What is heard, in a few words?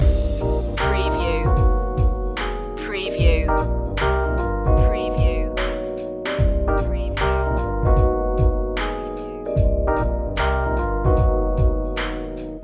blue funk loop